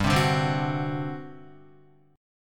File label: G Major 7th Suspended 4th, G, down, Guitar; GM7sus4 strummed; JGuitar